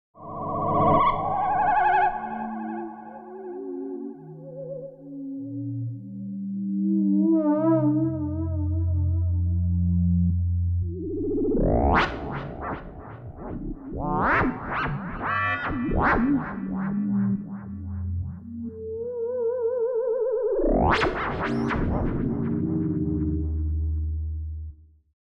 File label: FM Drone